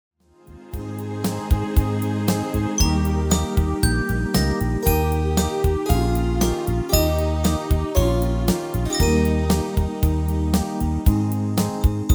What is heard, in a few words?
MIDI